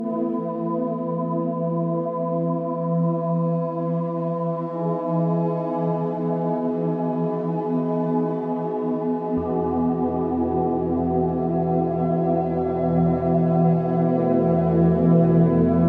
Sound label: AMBIENT